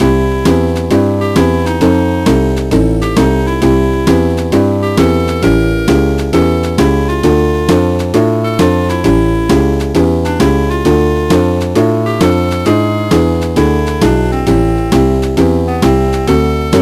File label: Menu; Toy Story